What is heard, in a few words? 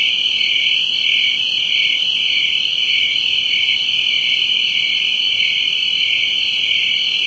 crickets